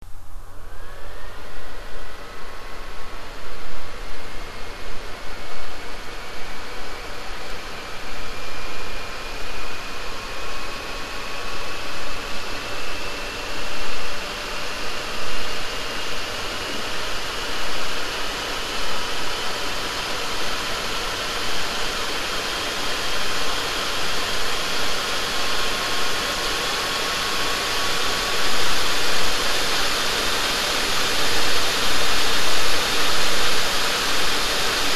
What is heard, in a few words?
turbulent, fan; vaio